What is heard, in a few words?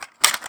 Firearm AR15 Remove Magazine; Firearms; Zomboid